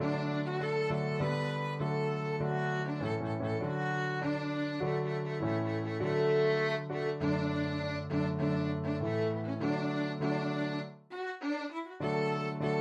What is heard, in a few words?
chinese anthem violin